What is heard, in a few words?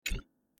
cancel